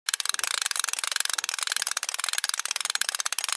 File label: Steampunk gears movement mechanical loop